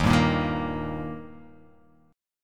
Eb Major Eb down; strummed; JGuitar

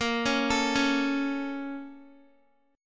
A sharp Minor Major 7th, down, Guitar; A#mM7 arpeggiated

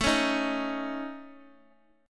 A Major Flat 5th

